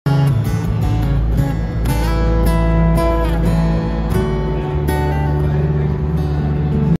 Harp Guitar; گیتار چنگ

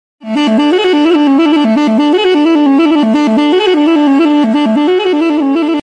Duduk

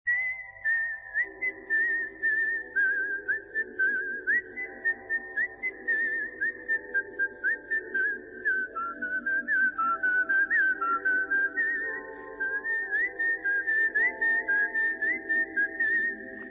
Soot, سوت; فیلم بیل را بکش; Kill Bill

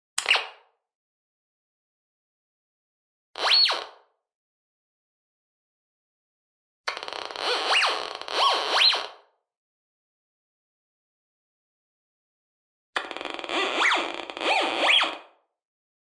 metal detector; فلزیاب

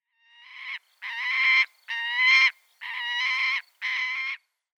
falcon sound

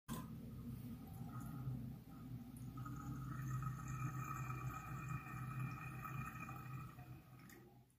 bathtub

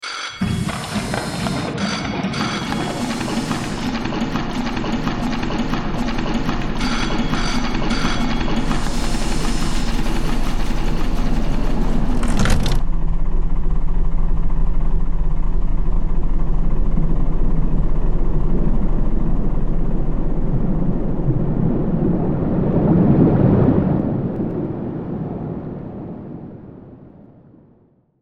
submarine; زیردریایی

